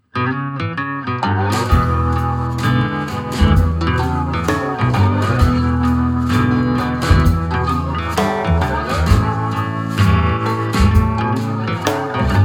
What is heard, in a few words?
blues boys